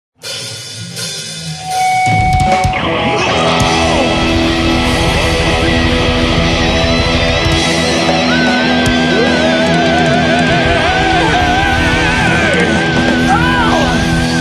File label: Live with 3 guitars